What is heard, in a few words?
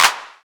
Clap